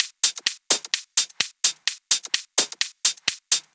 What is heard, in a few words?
Loop, 128 BPM